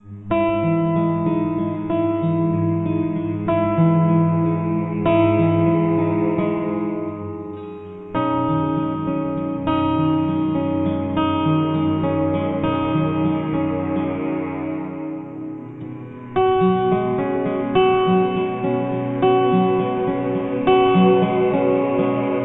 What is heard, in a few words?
circle music